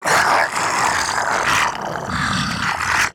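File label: idle, zombie male; nmr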